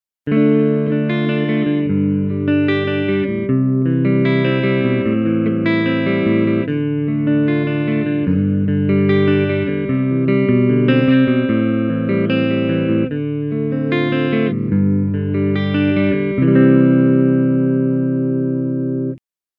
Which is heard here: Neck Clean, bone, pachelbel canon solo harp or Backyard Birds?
Neck Clean